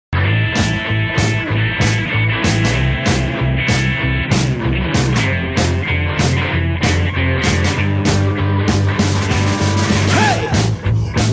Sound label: Buzzin